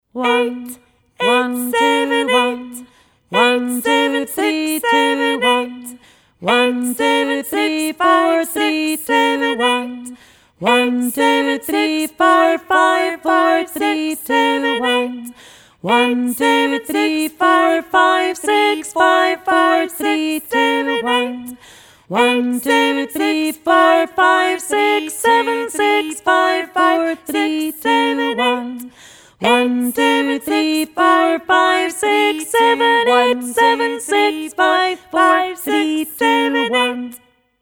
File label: Major Scale Ascending Descending Together